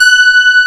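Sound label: brass G5, SYNTHESIZER analog brass; AKAI S6000 CD-ROM - Volume 1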